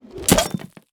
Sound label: ballista hit ground